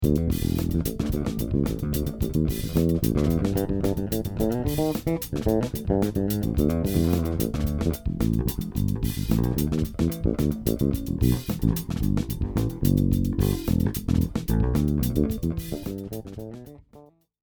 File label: sb500s finger sample